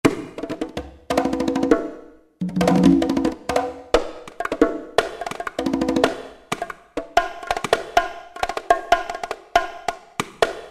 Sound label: Perc; Roland SRX-03 Studio SRX